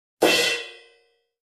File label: Drum crash hit and stop